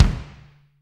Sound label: BD, DR BD; Best Service Gigapack 2